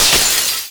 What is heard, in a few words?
Enemy Poisonwizard Mirror Crash; gamerip